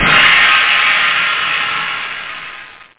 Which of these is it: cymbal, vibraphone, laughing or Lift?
cymbal